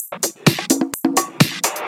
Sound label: Percussive Loops 128BPM; Vengeance Samples